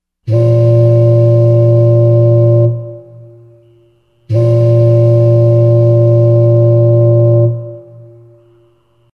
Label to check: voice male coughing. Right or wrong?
Wrong